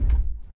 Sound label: footstep jog; Titanfall 2